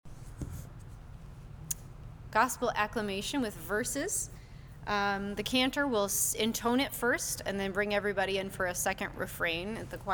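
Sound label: Gospel Acclamation Easter cantor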